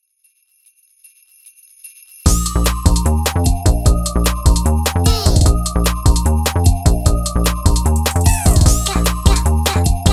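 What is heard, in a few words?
instrumental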